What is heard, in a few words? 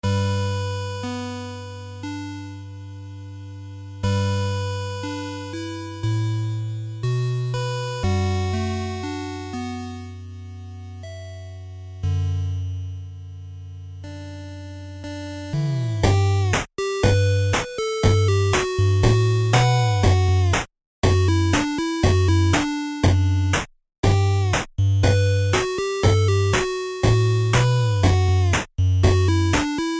8bit; Buttercup